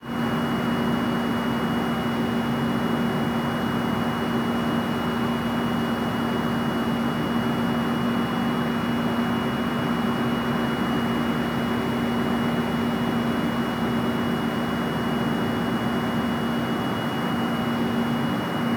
ship engines; World Of Warships

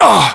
pain, UK, voiceovers

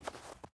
footstep boots snow walk; Rust

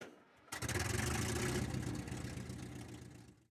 start engine, engines; World of Tanks

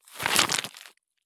stop gravel; Escape from Tarkov

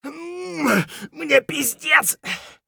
hurt near death; Escape from Tarkov; scav4

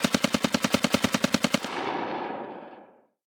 combat atmosphere; World of Tanks

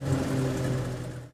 engines; World of Tanks

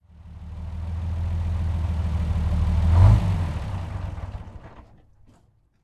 Valentine engine stop

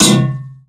tank hit small interior

